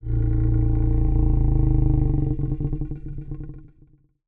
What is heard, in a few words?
Feline Long Calm, Monster Sounds; The Decline Of Warriors; Monster Sounds Volume II